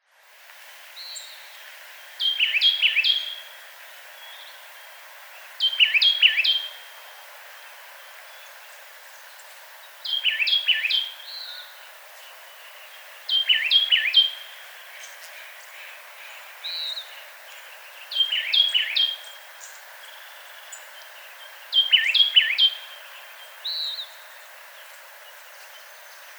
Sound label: baeolophus inornatus; Sikora kalifornijska; ptaki